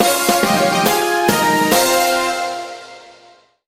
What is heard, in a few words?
Battle Fanfare